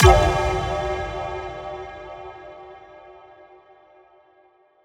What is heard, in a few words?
Impact